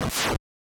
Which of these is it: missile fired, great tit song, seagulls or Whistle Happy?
missile fired